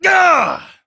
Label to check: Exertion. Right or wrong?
Right